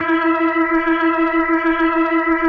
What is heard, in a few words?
E4F, B3, Vintage Keyboards